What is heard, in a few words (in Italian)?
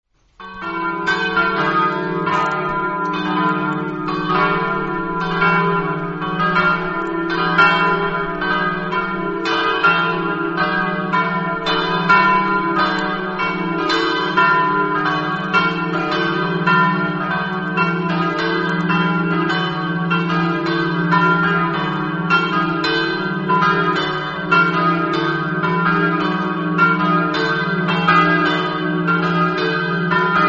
flagogna, campane